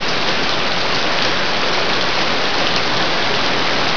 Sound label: rain